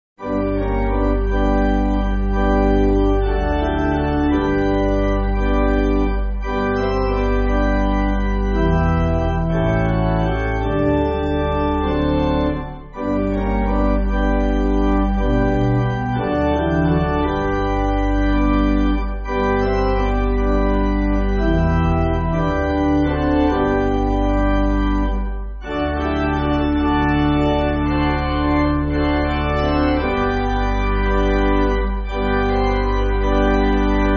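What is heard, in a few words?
Pipe Organ, church music; The Saviour Wants To Love You; Sankey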